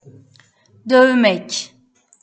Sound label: whip